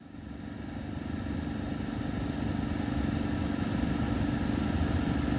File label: Blower noise, BMW Blower Motor